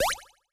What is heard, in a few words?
beep